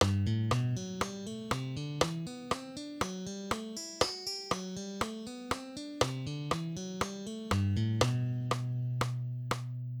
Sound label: Major 3 notes string skip